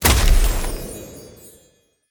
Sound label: Grenade Snowball Explosion